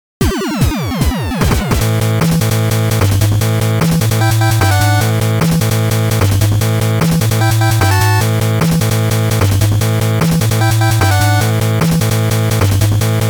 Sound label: Weapon Get; Rokko Chan